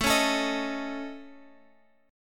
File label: A Major Flat 5th Guitar Open G